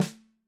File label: snare; soundscrate